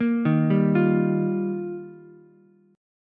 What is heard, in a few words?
D sharp Major Add 9th, Ukulele C6; arpeggiated; D#add9; JGuitar